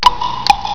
tick tock

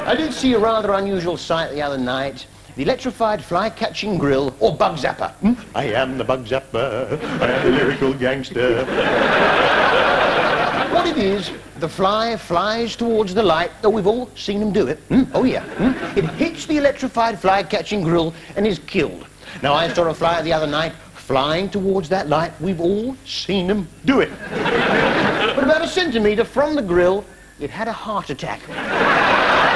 bugzapper

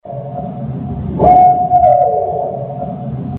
barred owl 1 note

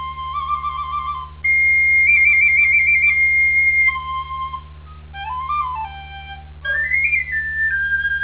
recorder sopranino; sampler

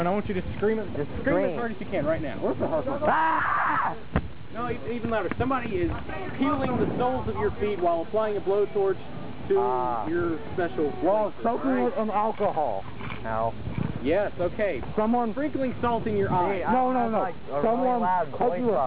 ht scream

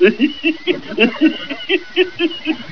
barney laugh

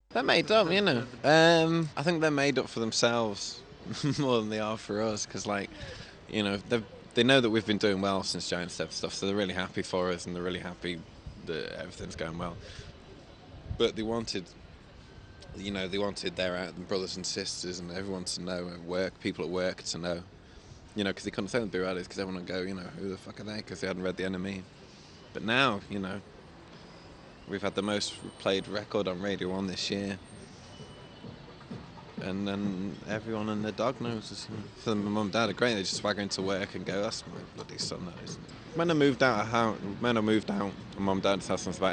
boo

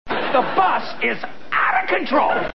bus

